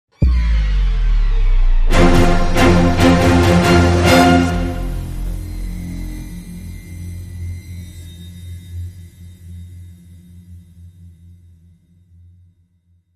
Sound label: Dramatic Sting